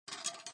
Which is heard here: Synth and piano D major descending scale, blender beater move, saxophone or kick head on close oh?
blender beater move